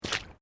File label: Footsteps Boots Asphelt Puddles Step; Footstep Foley; Footsteps (Sound Effects:Human)